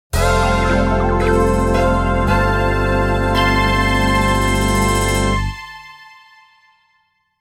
Course Intro Fanfare; Battle; Mario Kart Double Dash

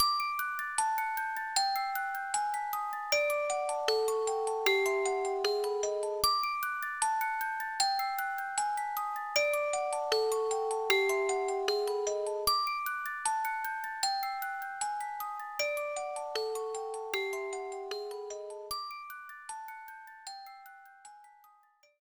BGM Game Over; Irodzuki Tingle no Koi no Balloon Trip; NDS

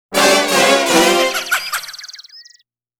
Heartbroken, Defeat Jingle; Genso Suikoden IV Original Soundtrack

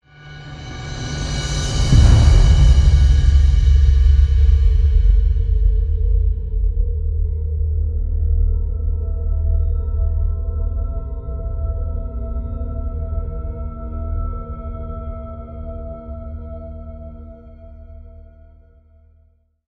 Stinger; Global Conversation

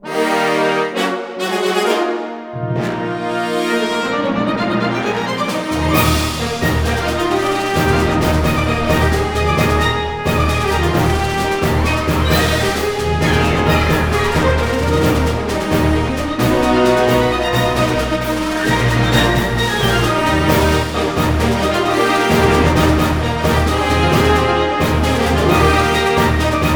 With Percussion; Metroid Blast; Training Zone

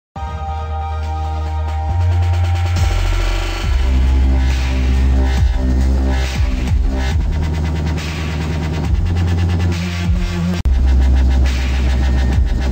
Dubstep; Club Penguin; Ultimate Jam Event Trilogy; Windows; Hollywood Party; Puffle Limo

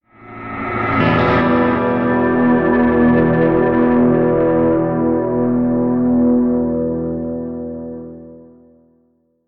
Sting; minecraft story mode gamerip 2016; Assembly Required; Boomtown

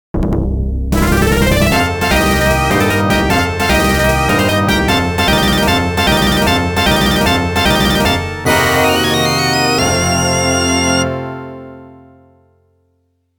Complete Fanfare; Namco Arcade Sound Digital Collection Vol. 2

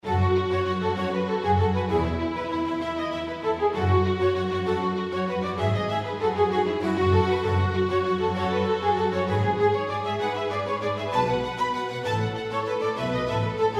Longbow Strings; The Lab; valve

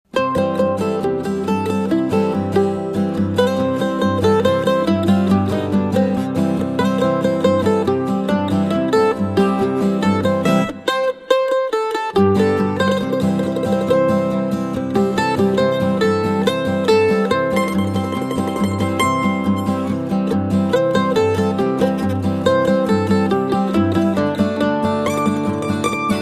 Early; Sid Meier's Railroads

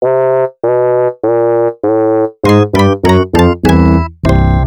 Game Over Tune; Cool Spot; Genesis

